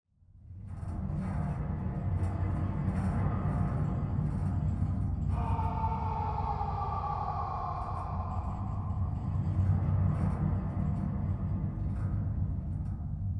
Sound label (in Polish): podklad sala tortur